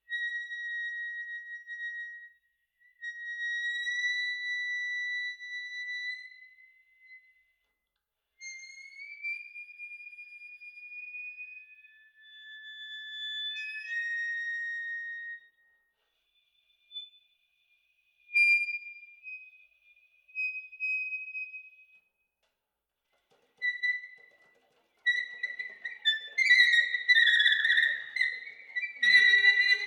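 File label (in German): alt, Zahntöne, Gehaltene Töne leise, Glissandi; Spiel mit Klappen, dann gemischt mit Stimme, Flatterzunge und Doppelzunge. Alt; saxophon; spieltechniken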